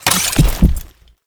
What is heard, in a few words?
Sniper Aim In; Warframe; Frumentarius; Exalted Sniper